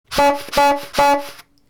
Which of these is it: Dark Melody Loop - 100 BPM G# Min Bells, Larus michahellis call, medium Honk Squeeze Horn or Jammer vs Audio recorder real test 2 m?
medium Honk Squeeze Horn